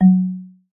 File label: Marimba, notifications